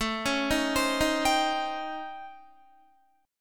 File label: A 7th Sharp 9th Flat 5th, Guitar; A7#9b5; arpeggiated; JGuitar